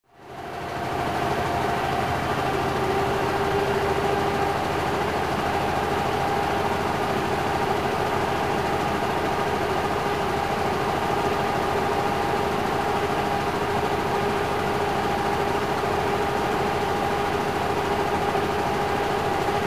zvuk gazetnogo pressa

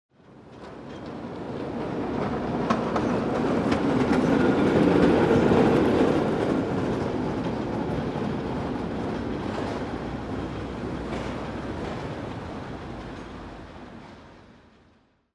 zvuk proezzhajuschego tramvaja

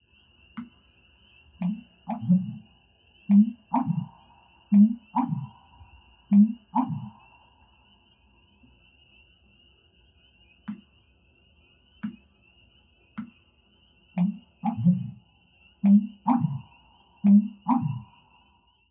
bolotnaja vyp

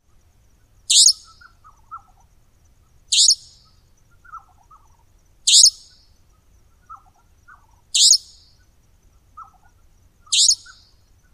golos savannogo kozodoja

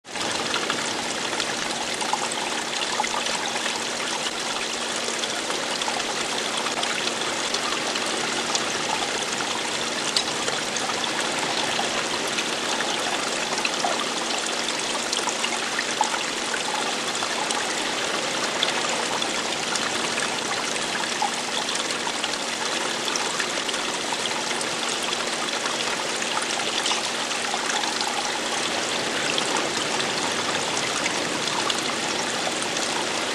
zvuk kapel fontana

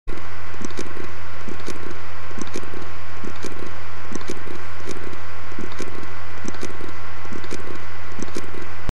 povrezhdennaja poverhnost zhestkogo diska

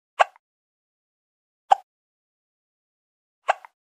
malenkij rebenok ikaet

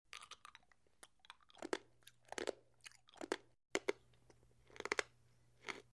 zvuk zhevanija konfety

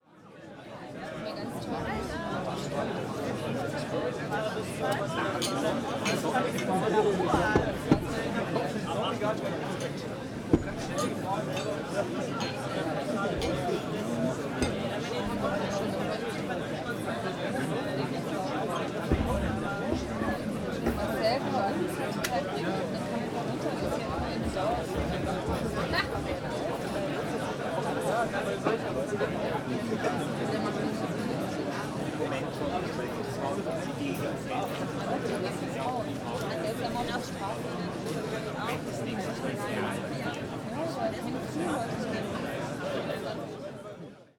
shum v pabe

